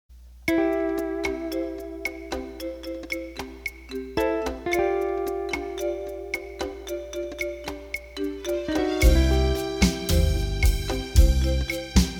Beguine Slow, accordion

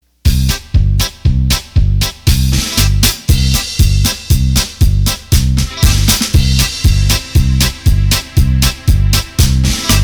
Polka, accordion; roland bk7m

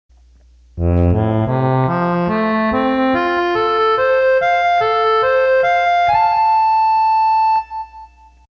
Accordion

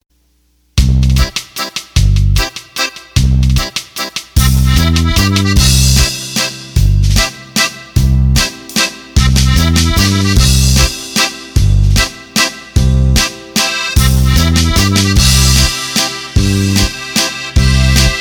Oberek; roland bk7m

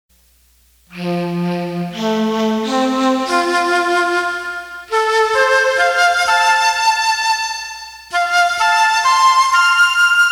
ketron Flute

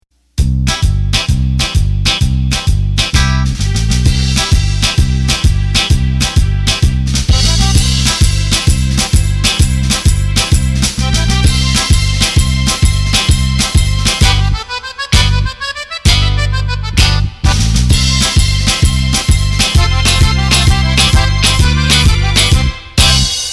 Tarantella, Roland accordion; roland bk7m

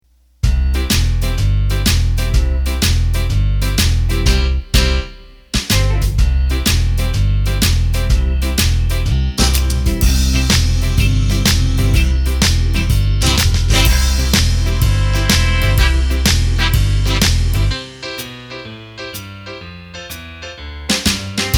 Simple Rock; roland bk7m

